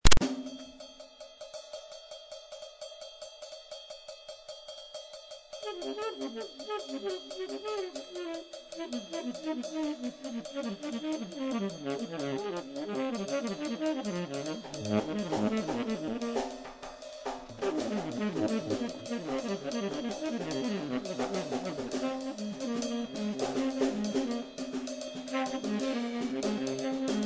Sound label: Trio